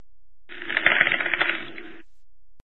ice

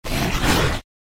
Worm Block

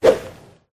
dog dodge

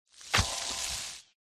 Light Spirit

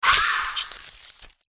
umertvie wound

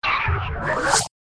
Air Spirit Dodge

